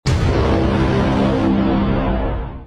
brass hit

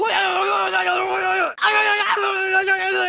screaming lol

